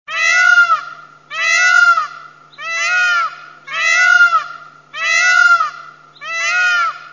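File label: Indian peafowl, Pavo cristatus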